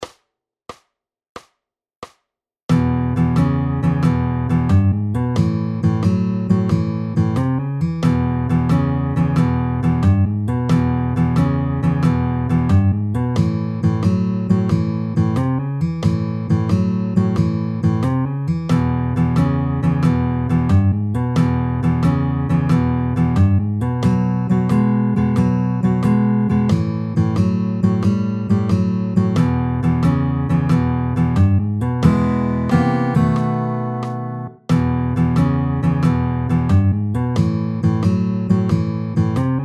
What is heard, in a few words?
Boogie Blues Quick 4